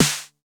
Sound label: SNR, Cheez Snrs